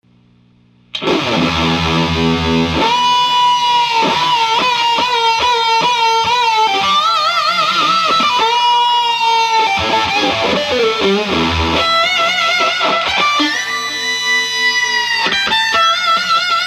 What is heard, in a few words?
6v6 octave